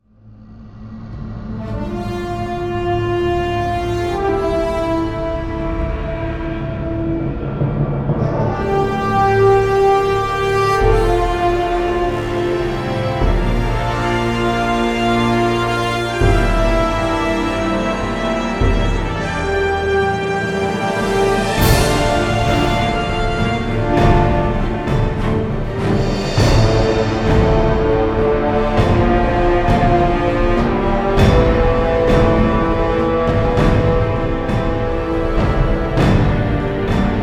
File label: Motivational Epic; Crysis